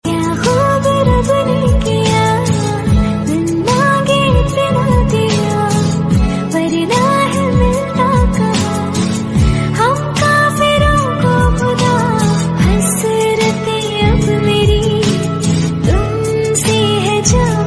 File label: Song Female Version Ringtone